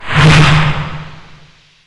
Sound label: necrotic strike